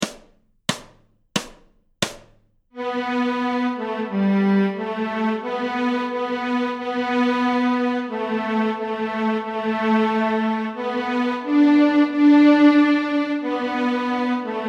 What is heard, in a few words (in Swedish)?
Stråk G, Nyckelharpa; Merrily Roll Along; Notträning